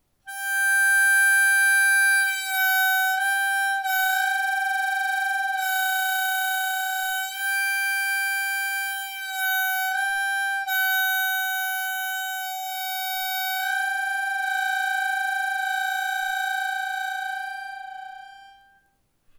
MuHa G-Dur Blow Bend Kanal 7; OscarLee

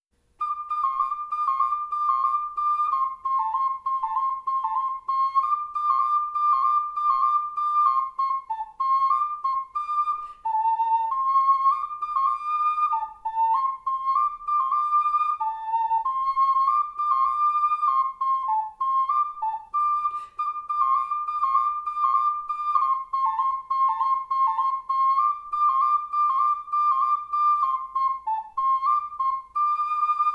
Vogel Okarina; Rotter; MuHaBuch 50; Tanzbär; Stennes-Falter

